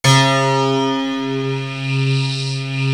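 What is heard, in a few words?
JD synth piano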